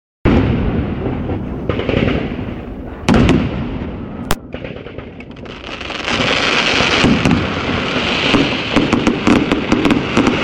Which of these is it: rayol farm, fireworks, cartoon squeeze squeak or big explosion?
fireworks